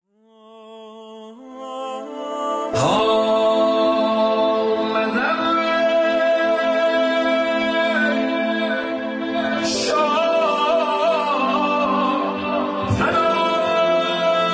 مولودی

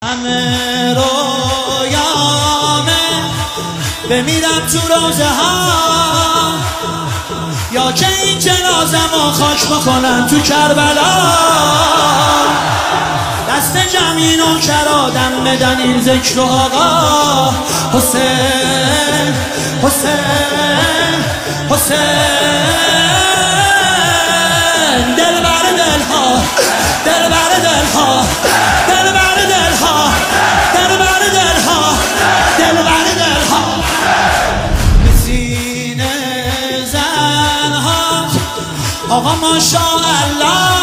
مداحی

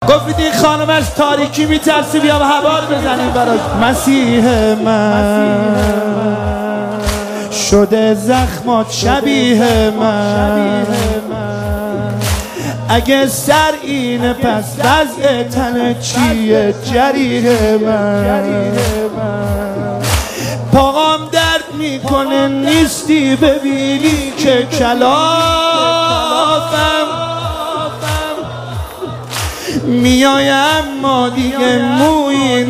مداحی

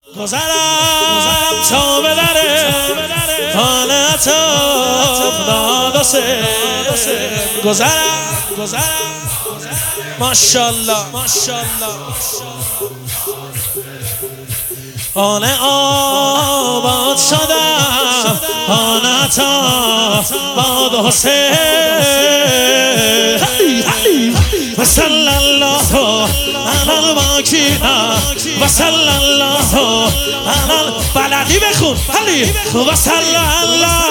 مداحی

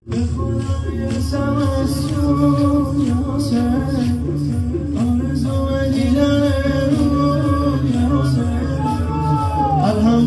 مداحی

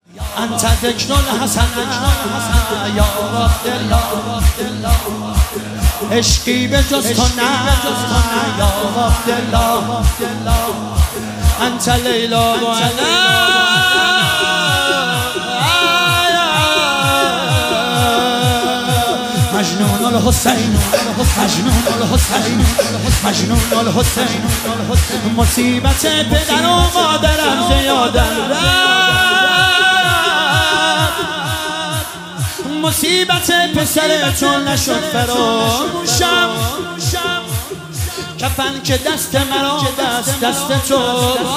مداحی; شور

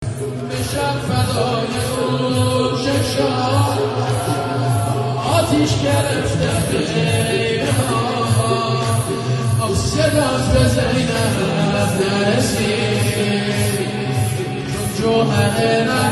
نوحه

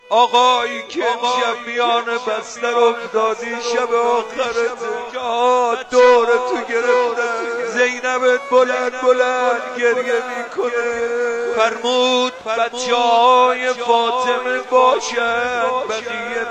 روضه; شهادت حضرت امیرالمؤمنین ع

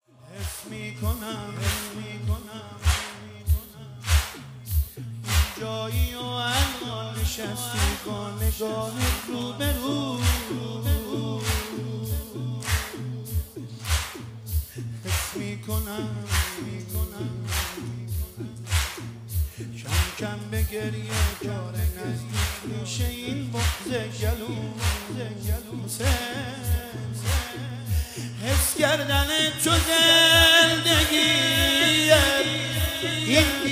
مداحی

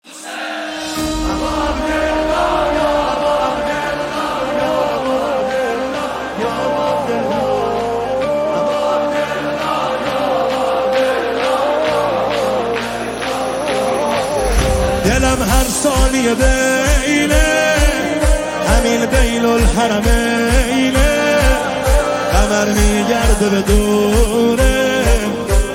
نماهنگ; یااباعبدالله

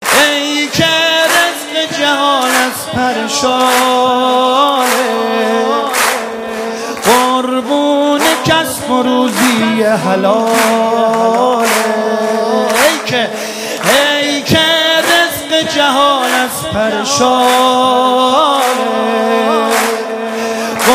مولودی

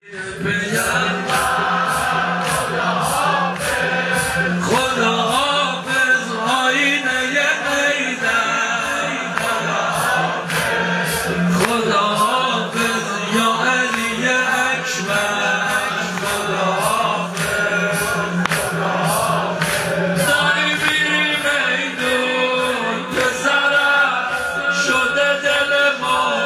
مداحی

